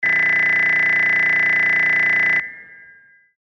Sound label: graph